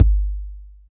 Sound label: low tone kick sound g key, Royality free kickdrum sample tuned to the G note